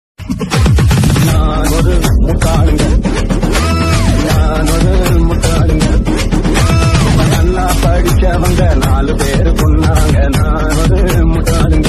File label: bgm